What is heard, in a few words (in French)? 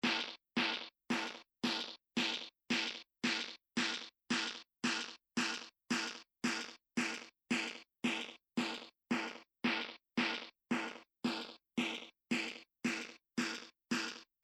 Snare